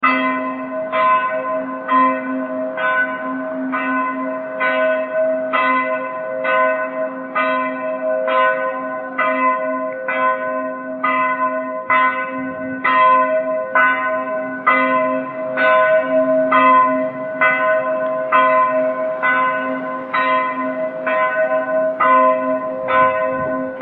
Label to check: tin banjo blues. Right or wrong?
Wrong